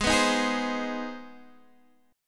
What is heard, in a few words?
C Minor Major 7th Sharp 5th; chord shape